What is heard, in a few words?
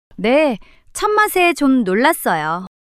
surprise